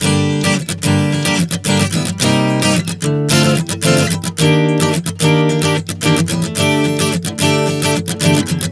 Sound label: Gm-Cm-Dm-Ebmaj7-D11 110 bpm, acoustic guitar chord progressions